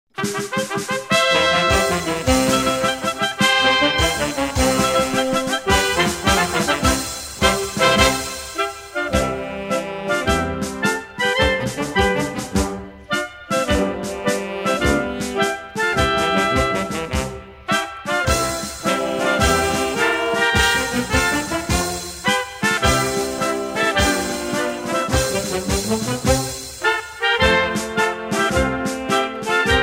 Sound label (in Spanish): himno version instrumental; himno a chunchi; simbolos cantonales